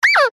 cartoon character voice high pitched ouch ohh as if sudden pain